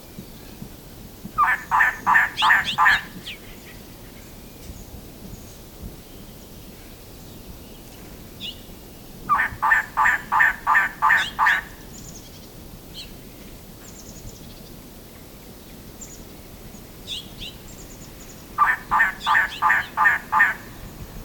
Elegant Trogon Trogon elegans

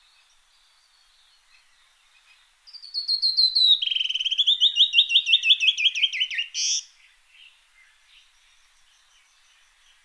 Canyon Wren Catherpes mexicanus

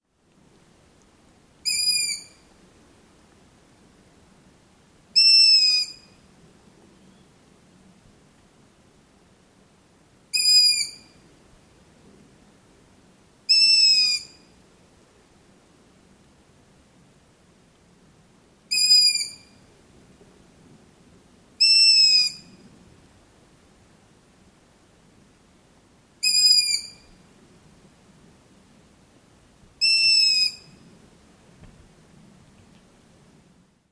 Long eared Owl Asio otus

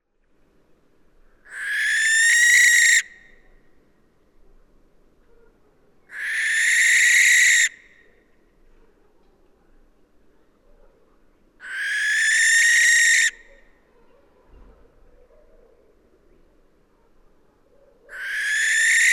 Western Barn Owl Tyto alba

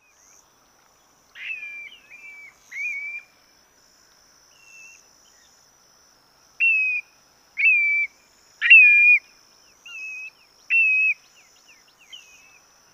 Grey Plover Pluvialis squatarola

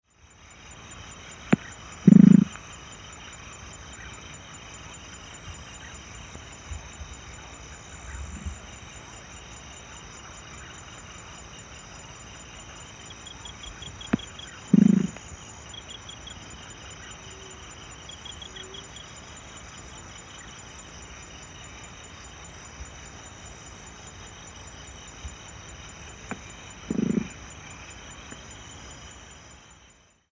White winged Nightjar Eleothreptus candicans

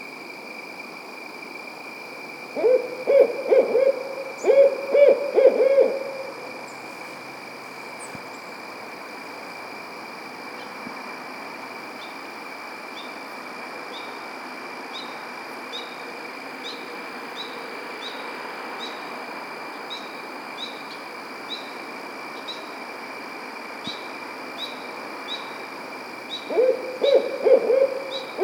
Barred Owl Strix varia

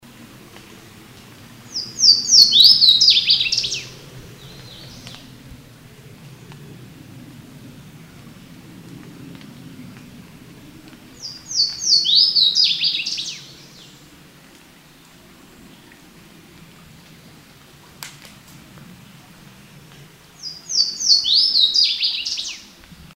Louisiana Waterthrush Parkesia motacilla

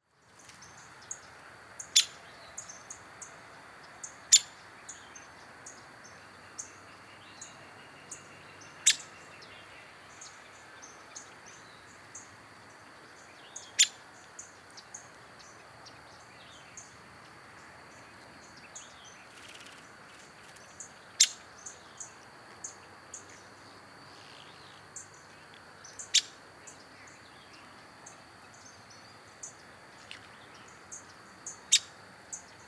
Ladder backed Woodpecker Dryobates scalaris

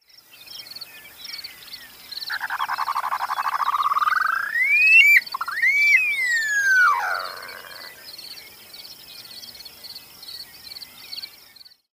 Upland Sandpiper Bartramia longicauda

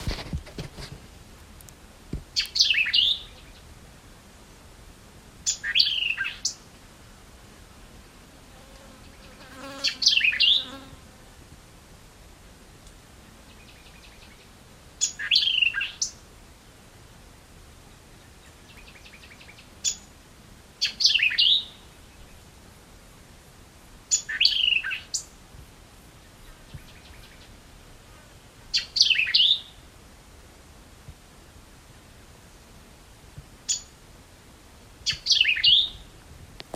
White eyed Vireo Vireo griseus

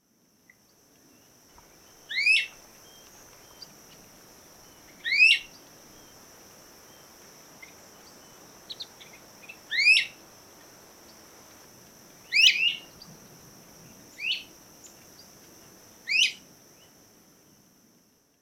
Great Crested Flycatcher Myiarchus crinitus

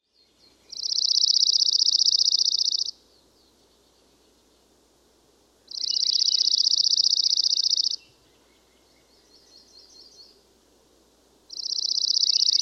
Dark eyed Junco Junco hyemalis

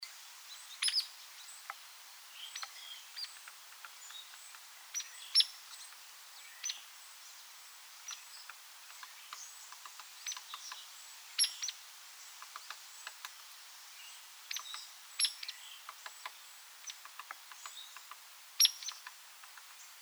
Hairy Woodpecker Leuconotopicus villosus

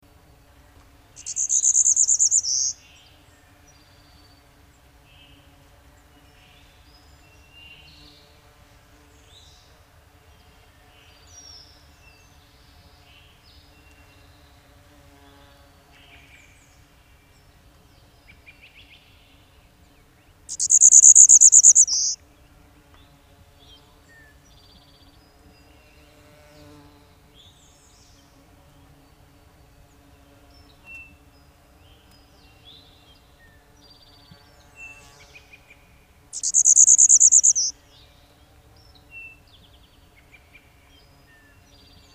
Golden winged Warbler Vermivora chrysoptera

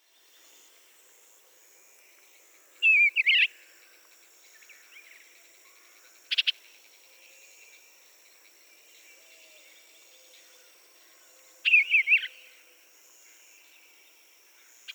Eastern Bluebird Sialia sialis

